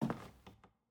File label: Footstep Wood